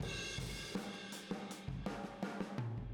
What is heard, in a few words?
Boogaloo Fill Room M, 82 BPM, Drums